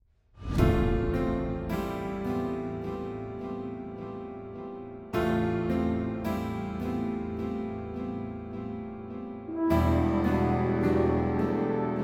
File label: enter house; Pineview Drive; gamesoundopedia